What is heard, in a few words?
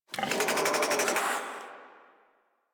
Infested Charger Idle, Enemies; Warframe